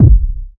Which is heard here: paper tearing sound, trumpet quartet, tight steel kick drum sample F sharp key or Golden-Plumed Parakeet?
tight steel kick drum sample F sharp key